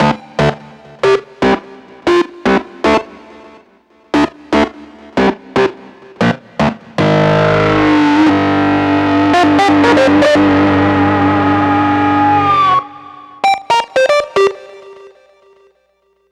Grunge Lead; Dr. Fink's Funk Factory

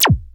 909-Style drum hits; FM 57 - The Ultimate Sample Collection (1997)

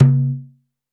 Dof Dom, Percussion; Roland BK-5

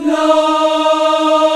VOX African Las; African Aahs; Roland LCDP11 Africa VOL-1

